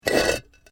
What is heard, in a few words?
Brick Medium Hollow Move